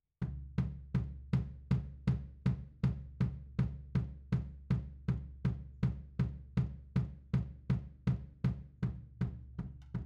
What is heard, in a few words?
Floor, 160 BPM